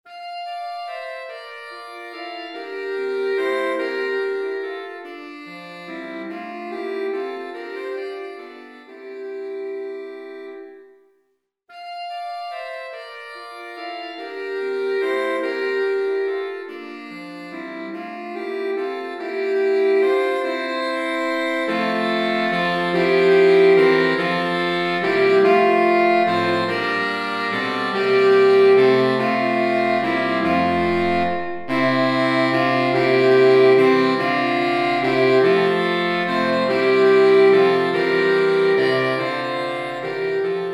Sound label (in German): saxophonquintett